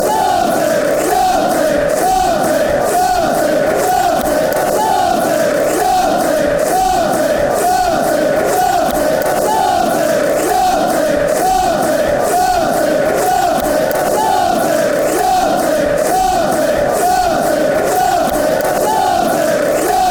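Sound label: celtic celtic, football songs and soccer chants; cfc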